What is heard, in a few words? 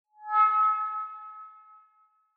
Megaphone Feedback Reverb